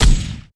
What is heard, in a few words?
chaingun fire